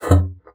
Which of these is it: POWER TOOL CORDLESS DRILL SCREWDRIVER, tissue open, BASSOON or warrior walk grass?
BASSOON